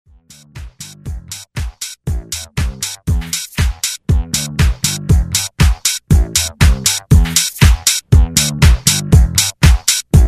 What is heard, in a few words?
80s Dance Redrum